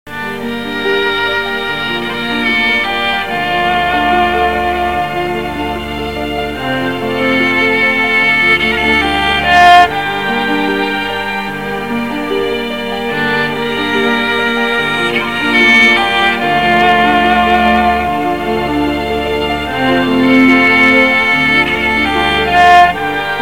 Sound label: Mystical traditional music; موسیقی سنتی عرفانی بی کلام